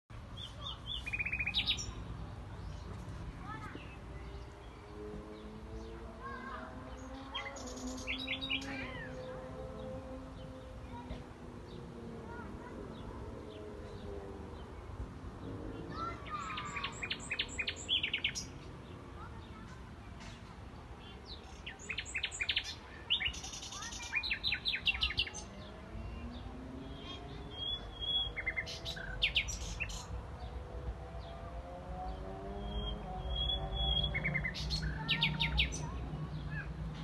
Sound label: Luscinia megarhynchos; SETE